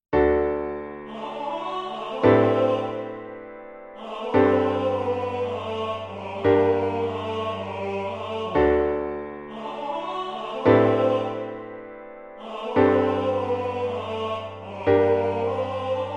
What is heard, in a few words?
MIDI SATB